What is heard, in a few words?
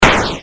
explosion; Asteroids-Reloaded